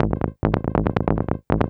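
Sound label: SYNTH BASS DANCE 140 A; eJay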